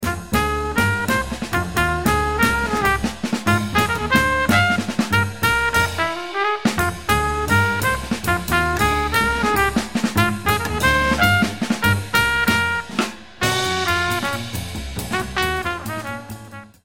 minus Piano

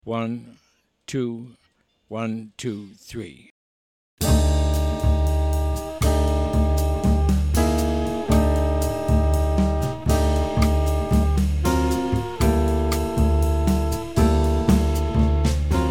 minus melody

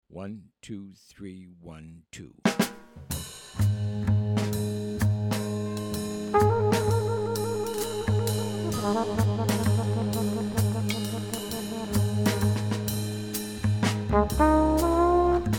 minus piano

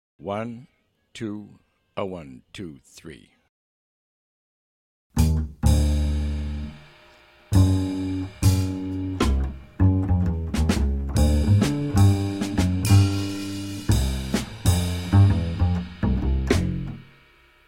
bass drums only

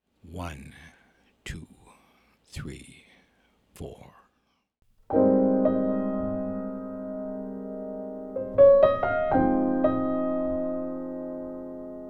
minus BASS

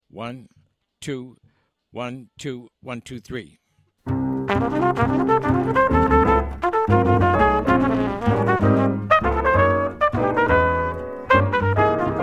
FAST minus drums

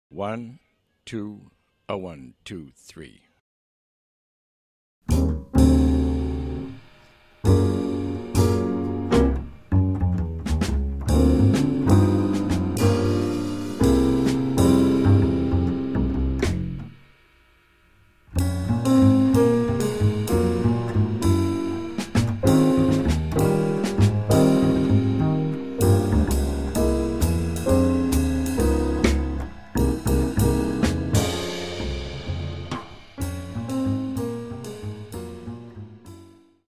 minus melody

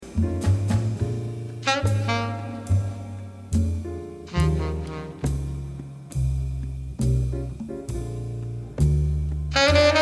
solo

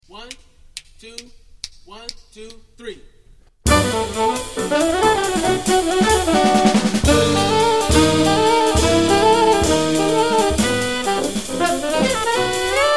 minus Bass

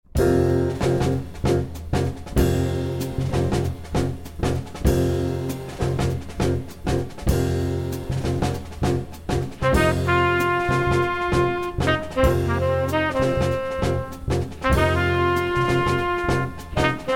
blues

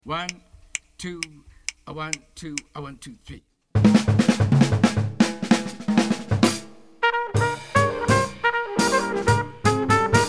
uptempo, minus Bass

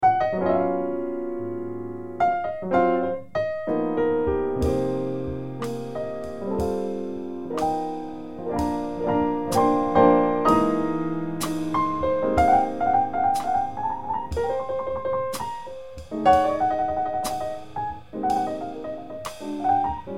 minus bass

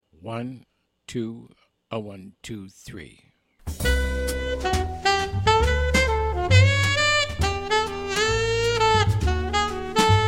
minus piano

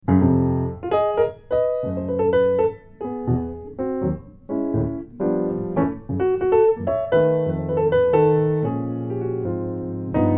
solo piano

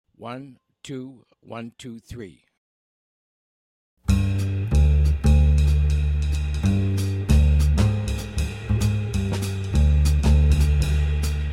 TRIO bass drums only

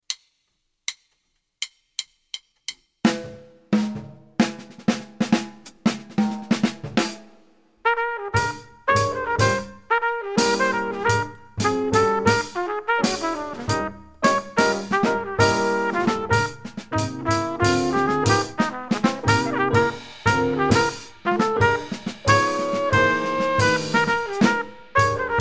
medium, minus Bass

